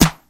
studio rap snare single hit a key; rap snare drum

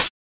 clear hi hat sound A key

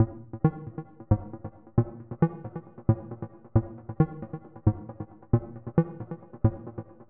modulated techno dark amp sequence 135 D sharp; synth loops

